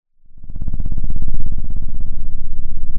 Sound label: pop, Sound of an engine revving